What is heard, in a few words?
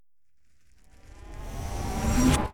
Charge Laser